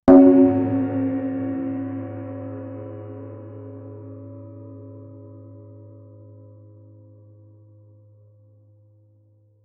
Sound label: resonant gong